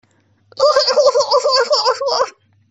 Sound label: laugh